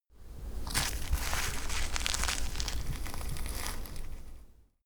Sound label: clothing against branches; foerster